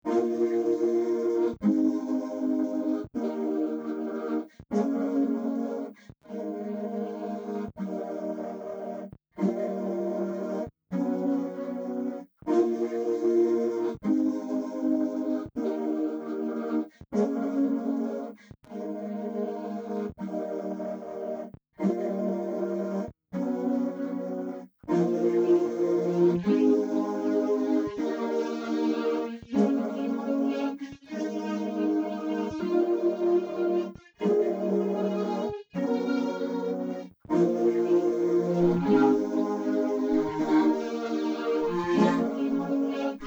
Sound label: vocoder